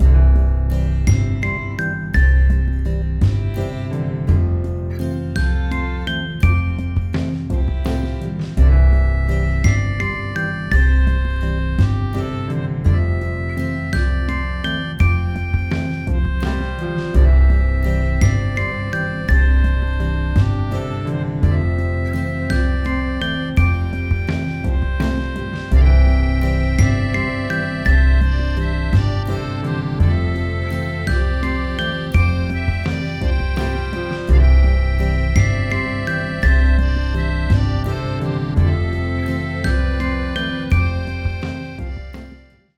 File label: Country Ride, ringtones; Samsung Galaxy-S25-Ultra